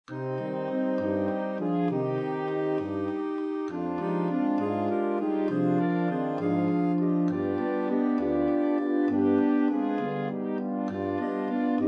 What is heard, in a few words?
Brass; Niva Pobilila Vzhe Stoїtj